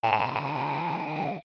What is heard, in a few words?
candy zombie; Adventure Time - Elemental; ThrillGames